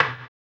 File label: HAMBONE, Vocal Blues Soul